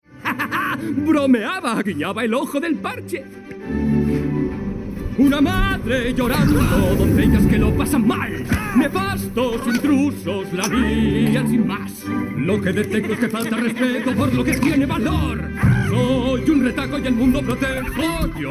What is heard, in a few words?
male spanish song movie; ideasonora